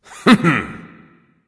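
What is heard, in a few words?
non-verbal heh heh; smite; voicepack; houyi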